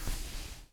foley cloth short; Earth and Beyond; EA Games

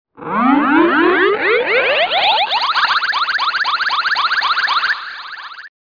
Woop Woop Swirly; Let's Discover Michigan!

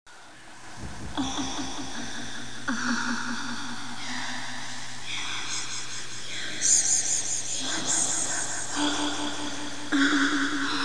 ORGASM; The Dream Machine - The Virtual Sexual Experience

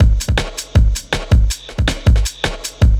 DRUMLOOP D+B 160; eJay

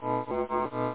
lvl spin; Chomp3D

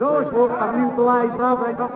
walla; DAoC

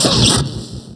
Puls Lsr

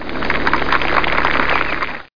applause; doom

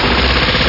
robo; SCI-FI Sensation v2.2; apc mission

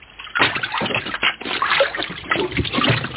bouee clap; BaseAuckland2

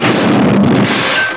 Kick Crash; AmIRCsounds

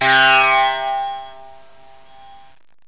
tamboura, indian inst; Octamed 6; walkabout

